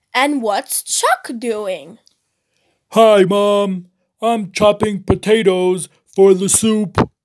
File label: chopping